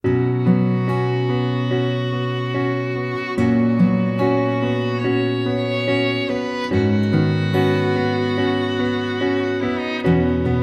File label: pizzica song karaoke